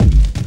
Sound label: big room techno kick loud reverbed; kick drum samples